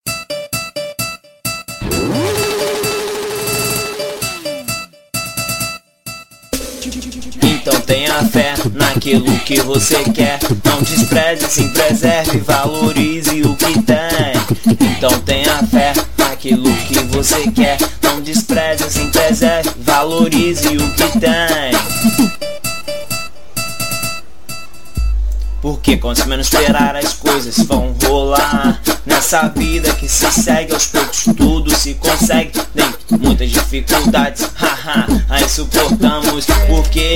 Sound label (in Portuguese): funk gospel